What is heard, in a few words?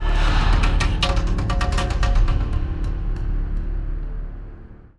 drums